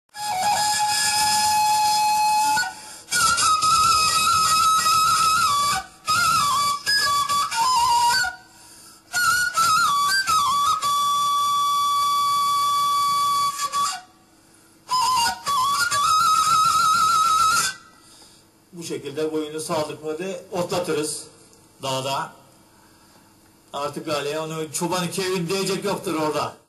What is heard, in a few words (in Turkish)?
dilsiz kaval